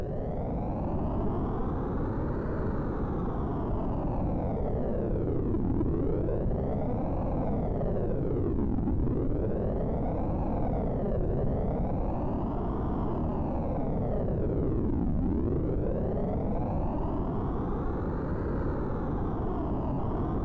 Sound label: sid MOS6581R2; Frightmare